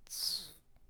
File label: saber open hiss